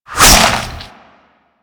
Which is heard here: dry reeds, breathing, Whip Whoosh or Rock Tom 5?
Whip Whoosh